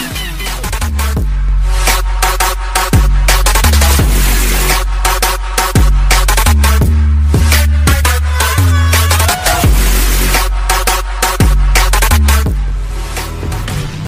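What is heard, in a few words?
instrumental sad music ringtone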